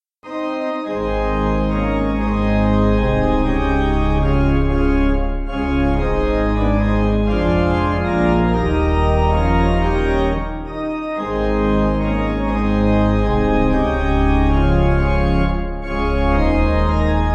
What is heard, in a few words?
Pipe, Pipe Organ, Music Backing